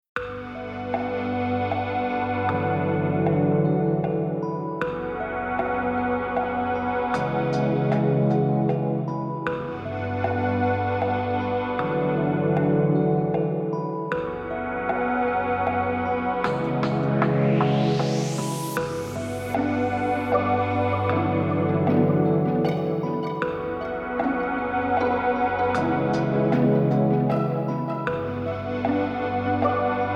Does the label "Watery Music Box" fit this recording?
Yes